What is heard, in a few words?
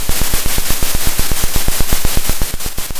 pop, Sound of a drum roll